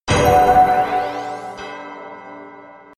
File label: daily reward